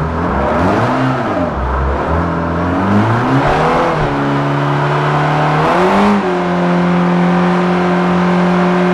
rev, vehicles cars; volvo; tdmcars

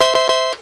winner; casino; slots

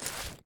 iron out, weapons; flood

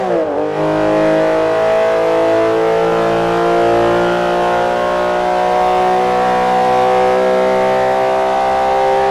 fourth, vehicles, porschegt3; tdmcars

